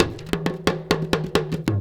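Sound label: big tama groove; Best Service; World Colours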